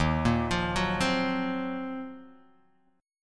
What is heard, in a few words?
D sharp Minor 6th Add 9th, Guitar, Open D; chordshape; 1,1,3,0,3,x